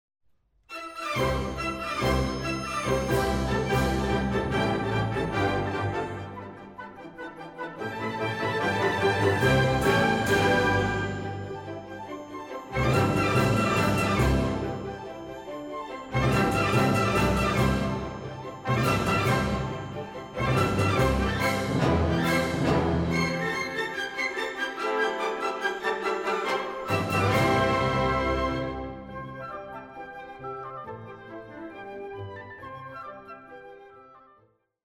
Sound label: bomb planted; music kits custom